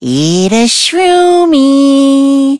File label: loading